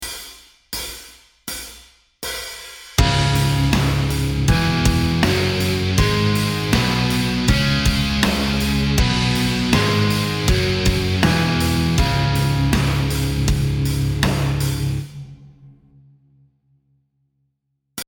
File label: Exotic Modes C Minor Pentatonic Relative E Major; metal music theory